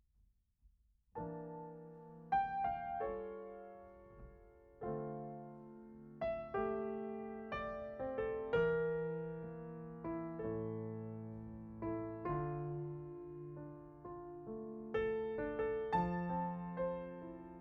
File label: Piano Mono